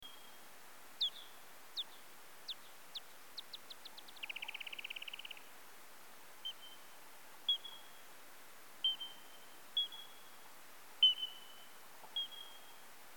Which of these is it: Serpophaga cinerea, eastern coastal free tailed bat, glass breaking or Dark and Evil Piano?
eastern coastal free tailed bat